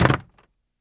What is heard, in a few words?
wood land; Golden Crayon Sounds